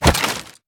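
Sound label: melee attack gun large; viper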